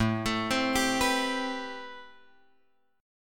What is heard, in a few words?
A-Minor 9th A; Am9 Chord {5 X 7 5 8 7} arpeggiated